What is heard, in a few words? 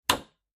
hammer hit